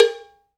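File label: PERCUSSION COW, Cowbells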